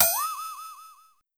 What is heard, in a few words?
Flex Tone, Metallic Percussion; SN-R8-11 Metallic Percussion